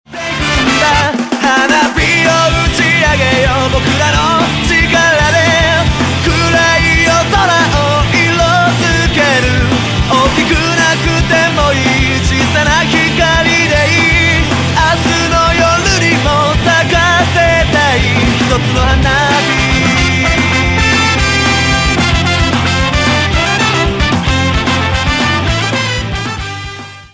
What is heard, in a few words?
music